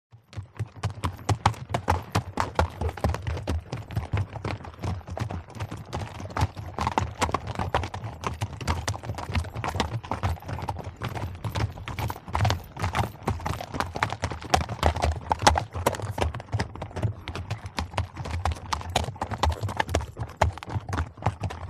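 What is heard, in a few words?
By Group Horses Walk Past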